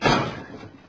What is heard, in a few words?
crash; hover